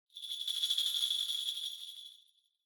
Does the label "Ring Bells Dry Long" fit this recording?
Yes